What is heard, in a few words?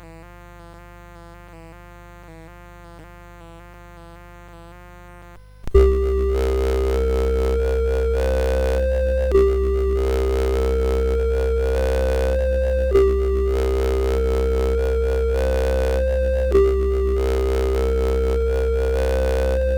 Spacey, CSG8580R5